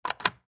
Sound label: Dead Bolt Lock